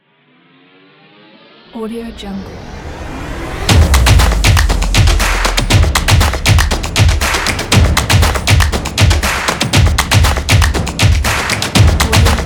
drums logo pack

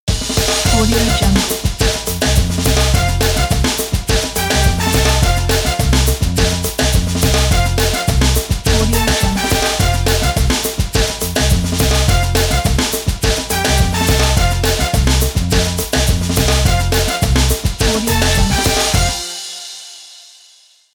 football ident

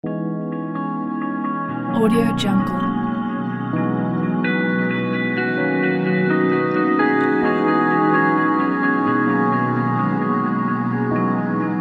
digital nature

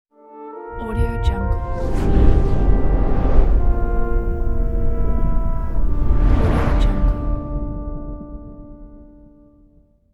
fire logo

